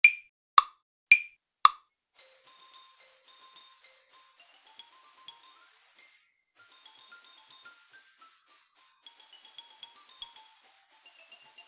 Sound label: Glissandi